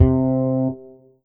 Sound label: Korg Triton Stein Bass C3